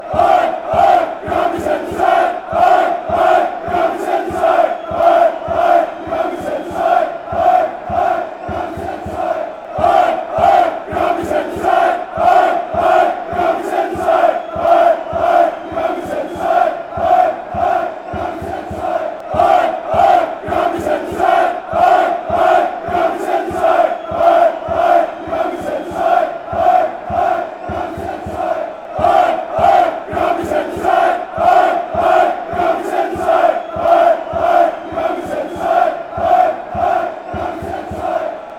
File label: aek athens chant